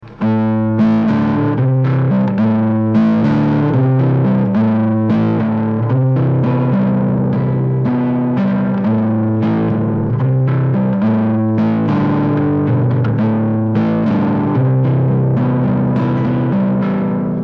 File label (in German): Gepannte Gitarre mit Zoom Overdrive